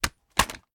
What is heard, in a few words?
reload end